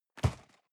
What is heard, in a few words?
cardboard box medium place rustle; objekte